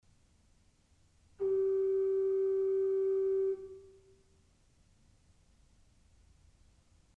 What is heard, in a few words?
Principal 4' g, orgel pipes